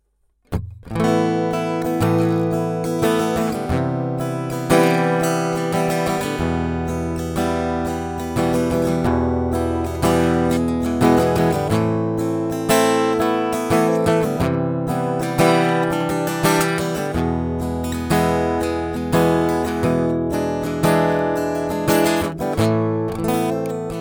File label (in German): A Gitarre; MOTU 828ES